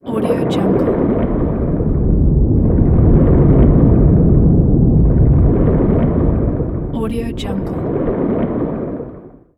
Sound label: underwater